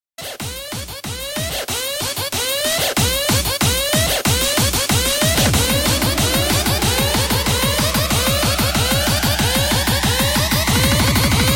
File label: Cartoon Bass